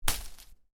Foliage hit soft, Foliage Impact, Soft Foliage Hit; Miscellaneous Impact